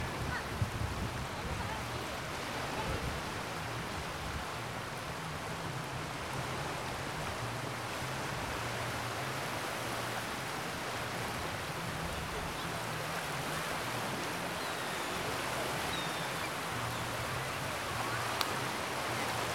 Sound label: Beach At Port Stanley